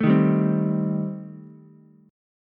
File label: Eb 6th Flat 5th, Ukulele; Eb6b5 strummed; 2,3,3,3; JGuitar